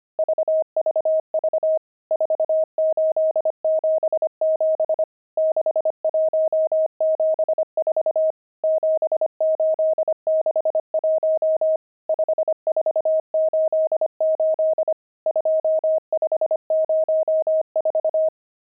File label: pangram, intermediate pangrams files